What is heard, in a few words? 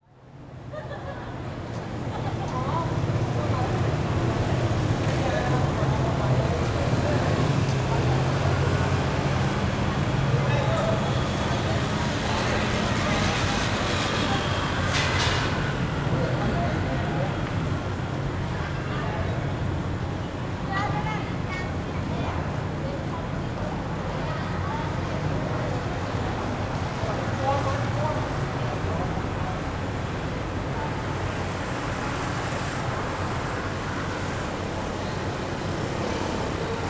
Traffic Intersection street 300 street 95 Phnom Penh; CAMBODIA; Les Cartes Postales Sonores; NOISE ON EARTH Vol1